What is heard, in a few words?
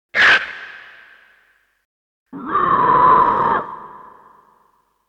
GameSpeak Scrab; oddworld abe s exoddus